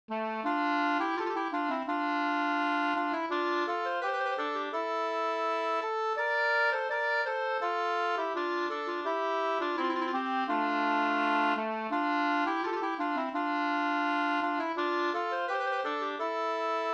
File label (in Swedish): skanklat